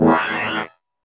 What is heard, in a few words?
charge; midnight guns; xrayspex